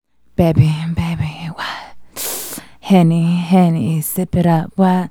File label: Female Solo, Vocal